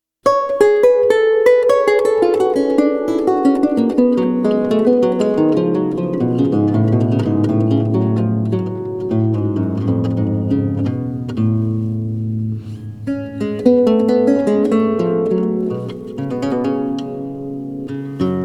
Lute Suite in E Minor, BWV 996 - I. Prelude; Bach, J S; Complete Music for Lute

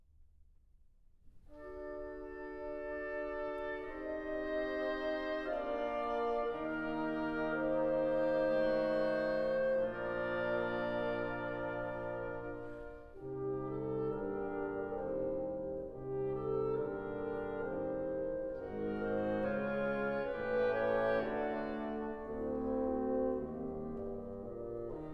Violin Concerto in D major, Canzonetta. Andante

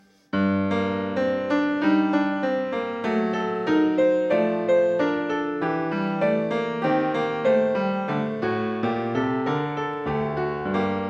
Goldberg Variations BWV 988 Variation; Bach

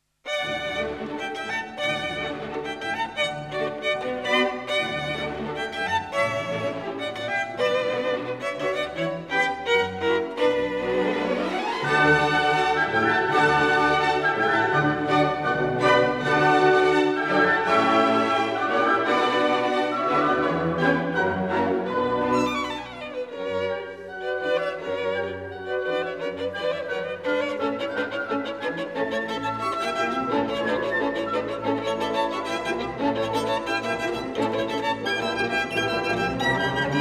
Violin Concerto Allegro

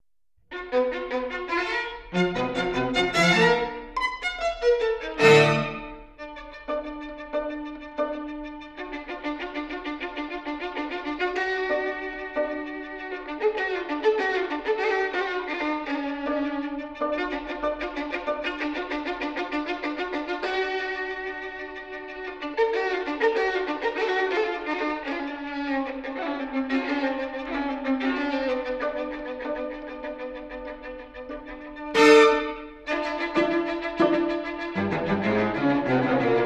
Quartet A Minor, String Quartets; Béla Bartók

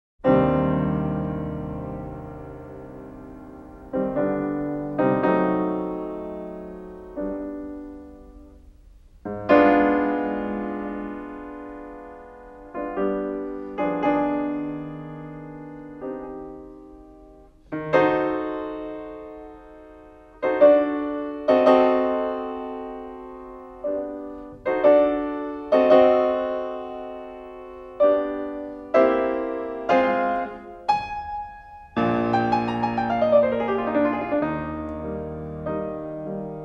Piano Sonata in C minor

